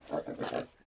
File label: pigs beastlike screams